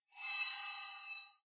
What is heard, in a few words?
Ui Counter Shine; doodle jump 2; android